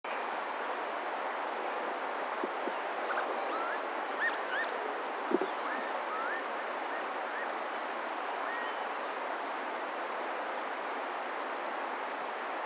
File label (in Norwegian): fuggern